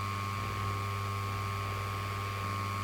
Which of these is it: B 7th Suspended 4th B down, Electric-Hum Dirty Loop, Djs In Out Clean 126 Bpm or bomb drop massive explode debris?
Electric-Hum Dirty Loop